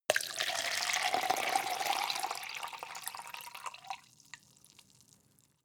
cup plastic pour hard

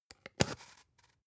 spice jar big scratch close long; industrie wirtschaft; gastronomie

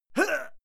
male fight attack hit; soundpool

